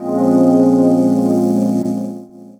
Chords Tones; Equipped Music; Loungin House

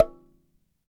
Dyna Sansa, Africa; Sansas; Roland LCDP11

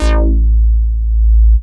bass techno

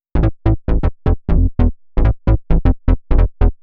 Bass 132 A, uk garage

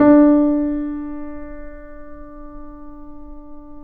PP D 6 off, Grand Piano off mic

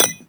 HORSE SHOE, SPORTS; AKAI S6000 CD-ROM - Volume 1

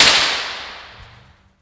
PRC CRACK; Roland L-CDX-01

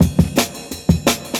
170, Jungle Breaks; Church; Original Jungle Breaks

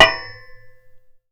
Radiator, contact mic, Metalics

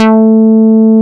JUNO E-BS A4; Drums In Your FACE!

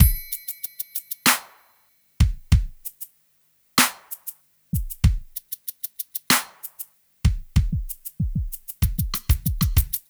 Smooth, R&B Drums

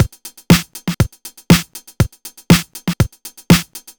120 Basic, Drum Loops 120bpm; Terradome; Nu Dance Electro

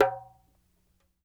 Dumbek Ring, World Percussion One Shots; Sony CS

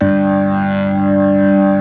G1 SLO POW, POWER B3; Northstar - New Gold VOL-1

